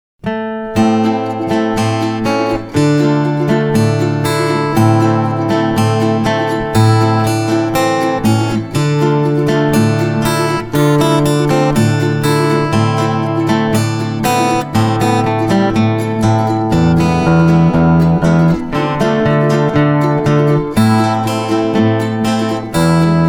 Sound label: clean hands, Gitarre; blues; ragtime